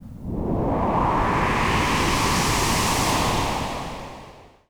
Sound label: Swoosh